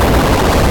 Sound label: rumble loop; Dont Get A Virus